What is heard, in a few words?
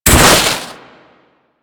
Heat Gun Hit Effect; Grineer